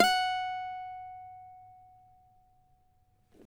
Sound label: CAVAQUINHO SLIDE, Guitars